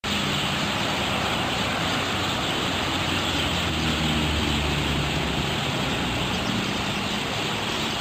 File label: Starling